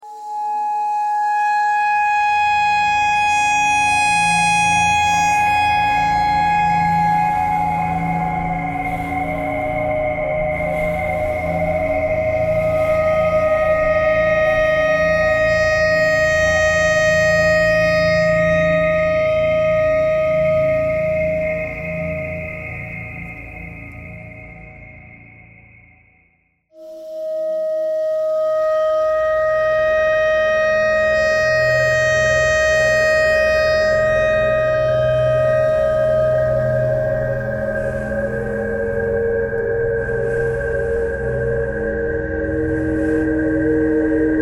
traffic kcore birds a clarinet notes e thread pentatonic; bowedmetal